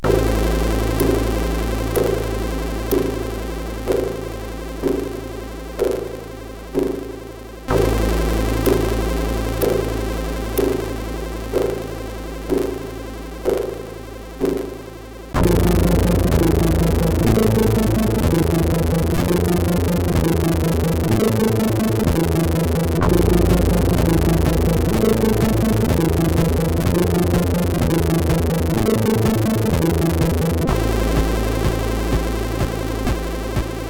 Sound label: Church Bells, CSG8580R5; HVSC